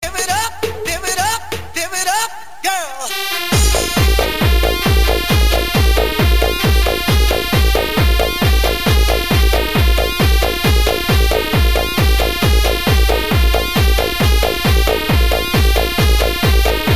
Nagin

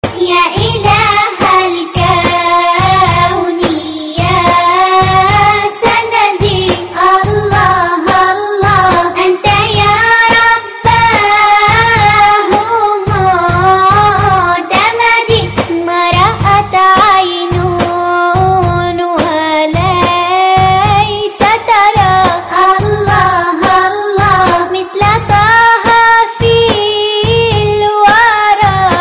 Arabian Music

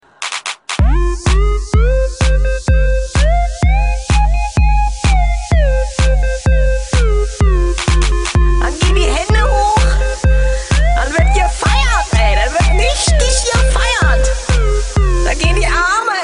Techno

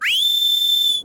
Whistling; Agro

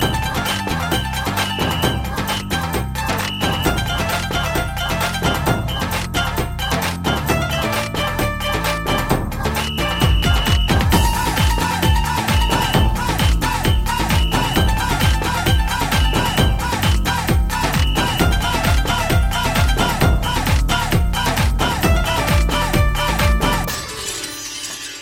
Inst

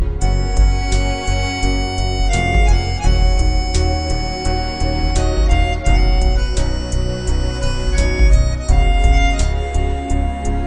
Violin

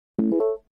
MINI Door Chime

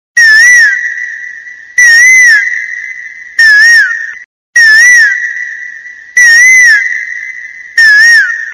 Koyal Ringtone

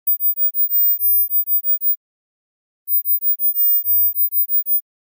1000 Khz Nokia Sms

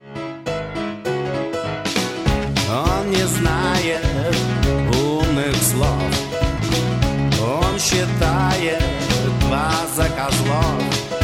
blues

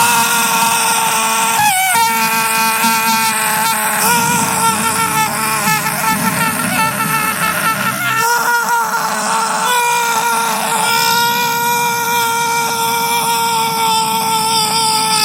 Aaaaaaahhhhhhh; JSE

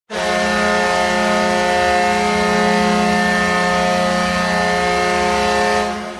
Goal Horn; Panthers

